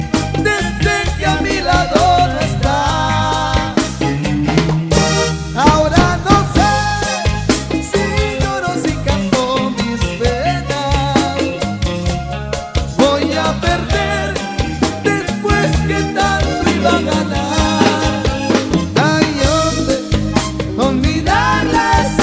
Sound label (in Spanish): colombiana; latino